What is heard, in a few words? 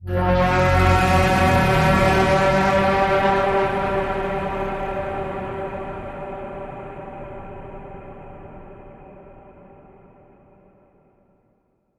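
Creature Doppler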